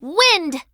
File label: wind!; tressa